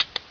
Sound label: button rollover